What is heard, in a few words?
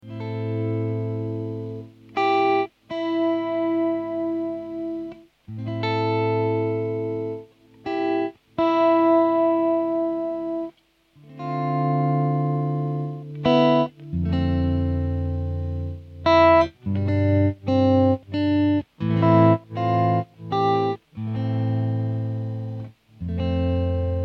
Am85 GTR Volume Pedal Motif